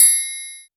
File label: perc, Single Drums